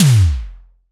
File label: Synth Drum 2, Roland BK-5 Toms